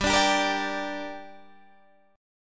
G Minor G, Guitar Standard; strummed; 15,17,17,15,15,15; JGuitar

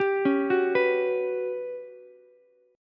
G Major 7th Flat 5th, Ukulele; GM7b5 arpeggiated; 12,13,14,14

